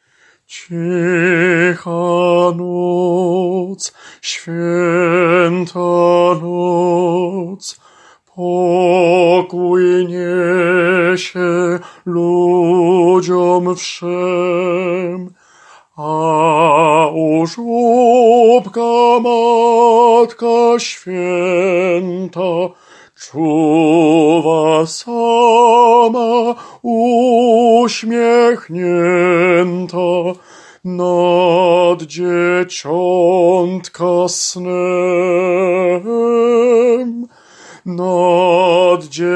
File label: TENOR; Cicha noc